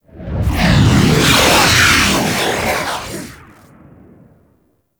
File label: Fighter Ship Close Fly By, Space Fighter Ship Engine; Warframe; RailJack; Enemy Ships; Corpus